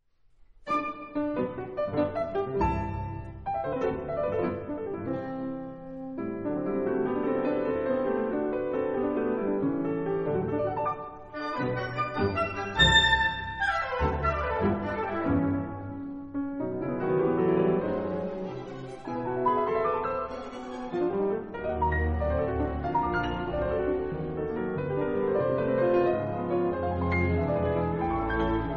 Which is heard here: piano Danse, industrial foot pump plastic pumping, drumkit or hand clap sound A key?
piano Danse